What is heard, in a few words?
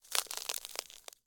ice